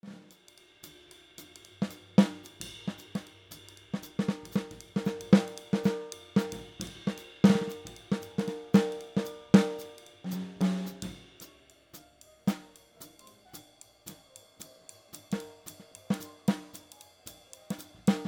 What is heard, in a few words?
snare unprocessed